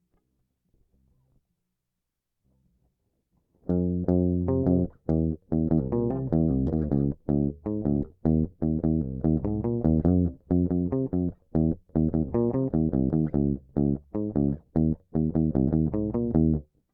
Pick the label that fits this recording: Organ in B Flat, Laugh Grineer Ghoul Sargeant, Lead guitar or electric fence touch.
Lead guitar